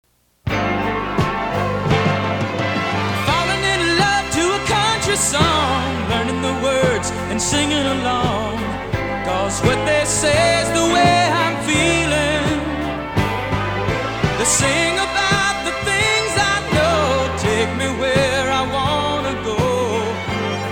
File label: Country Long Jingle; WCXI AM